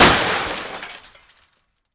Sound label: glass break